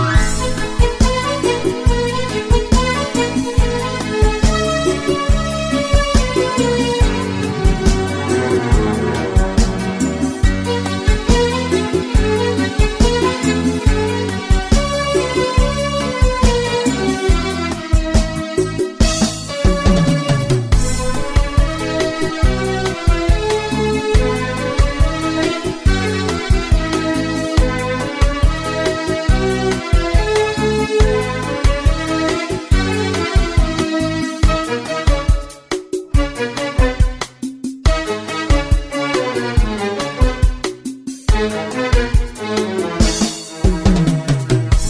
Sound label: Flute